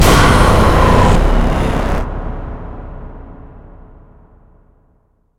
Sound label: Music; unreal development kit